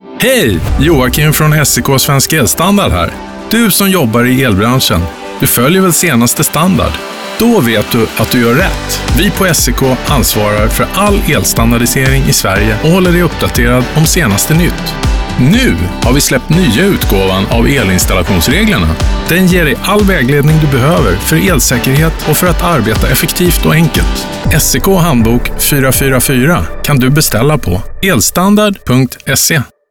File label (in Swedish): musik; Svensk Elstandard